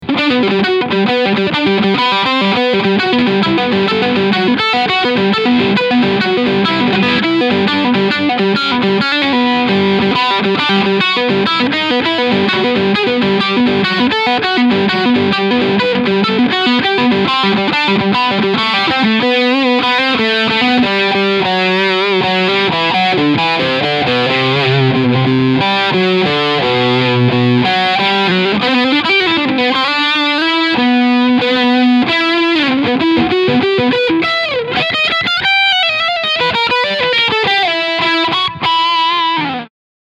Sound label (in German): Leadsound Steg Pickup; Ibanez ATZ100 SBT